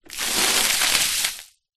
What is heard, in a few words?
household water from bucket throw splat concrete